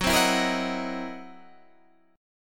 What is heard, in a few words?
C 7th Sharp 9th Flat 5th Gb